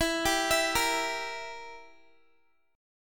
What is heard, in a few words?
E Diminished down Guitar; arpeggiated